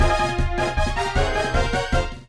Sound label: Short Attack